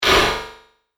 Angry Dark Metal Impact; Miscellaneous Impact; Andrew Fleming

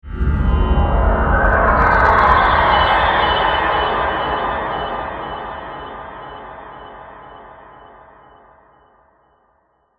Synth, Stab, Reveal, Mysterious; 5.1; Sound Effects:Science Fiction; BlastWave

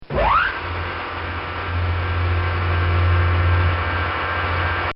Conveyor Belt; Transportation (Sound Effects:Backgrounds); This sound effect was recorded in a custom built sound booth

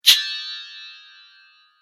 Chime Whoosh; Sound Design

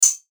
Click Button

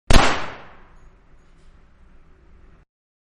Guns Indoors-9Mm Semi-Automatic-One Shot; Pistols (Sound Effects:Guns); Manhattan Production Music

